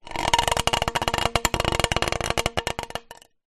small metal pole scrape on cobbled concrete ground; foley